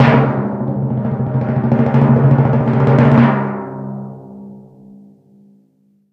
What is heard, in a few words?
Stinger Timpani